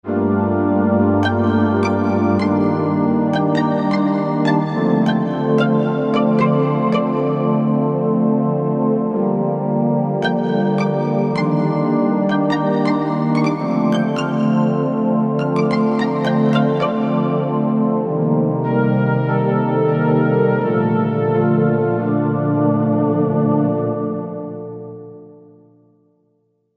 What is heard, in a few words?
Bras Pizz, Korg MSC-1S; Korg MSC-1S Variety